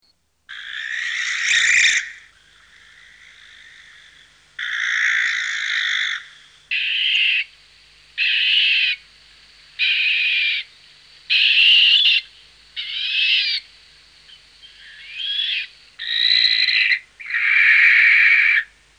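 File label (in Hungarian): Gyöngybagoly; Madarak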